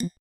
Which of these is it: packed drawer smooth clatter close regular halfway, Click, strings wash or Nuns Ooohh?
Click